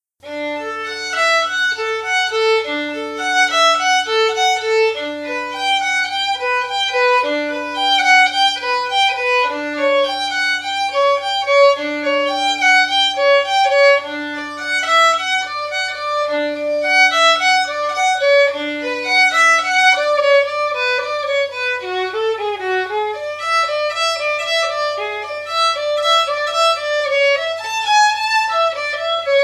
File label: Cello Suite Violin Solo